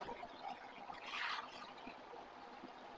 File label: Basement filtered